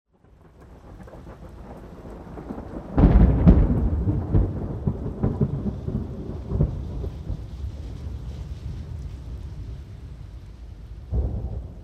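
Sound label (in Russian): два глухих удара и дождь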